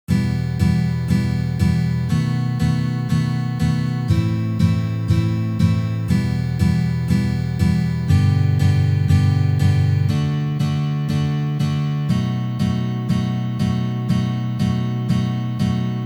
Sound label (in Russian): Uprazhneniya dlya igry akkorda B7